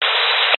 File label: technology cb radio static burst